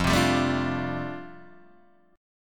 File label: F 6th Add 9th F down Guitar Open D, chord